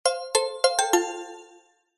multimedia alert notification mallet musical short positive success